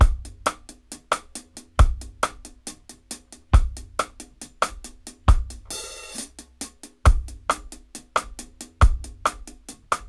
DRY, DRUM LOOPS, 068 BPM, Dub; Drumdrops In Dub VOL-1

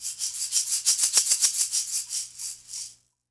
Shakers Ending, 70s Analog Funk; Sonic Foundry (Sony Creative Software); What it Is! 70s Analog Funk

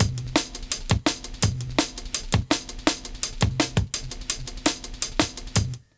LOOP, Jungle; Explosive Jungle; USB Soundscan vol.05; AKAI

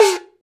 HuYinLuo Hi Mute, Gongs; Cymbals

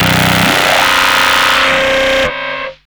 META FX, Hard & Loud Techno; USB Soundscan vol.01; AKAI

